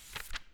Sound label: book page flip; UI; ProjectEleri